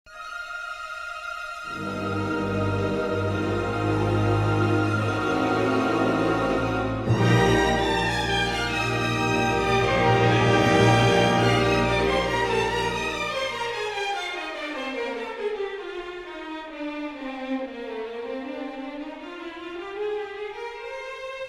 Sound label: Giselle Female Variation Act I FAST